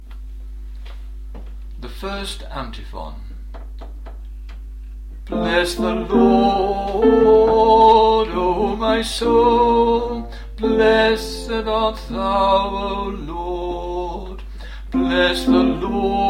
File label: first antiphon, Music for the Divine Liturgy